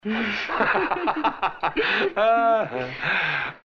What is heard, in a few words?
laughter